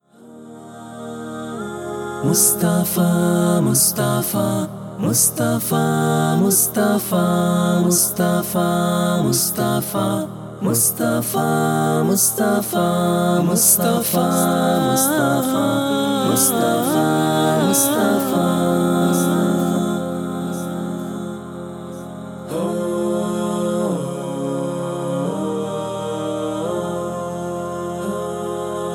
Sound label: Karaoke Version